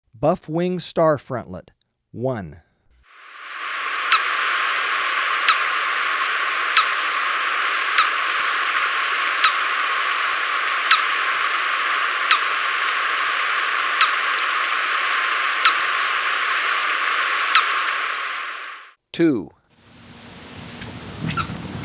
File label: Buff-Winged Starfrontlet, Birds of Ecuador; S. America